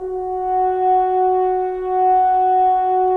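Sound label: Solo French Horn, Brass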